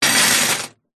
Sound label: keys large bunch prison guard set down on metal surface hollow